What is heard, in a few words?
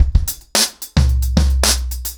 110BPM, acoustic drums, drumloops